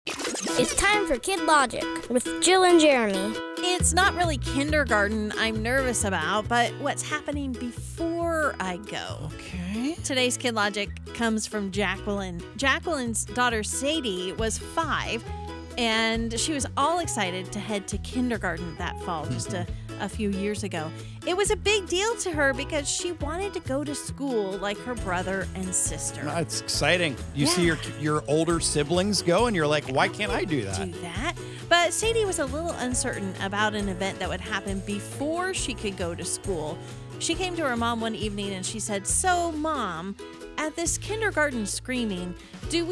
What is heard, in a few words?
Kindergarten screaming; Kid Logic